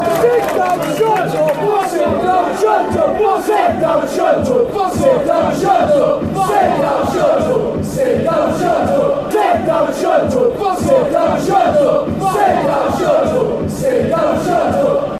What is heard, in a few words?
sit down shut darlington fanchants